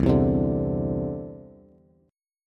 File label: C Major Add 9th, Bass; strummed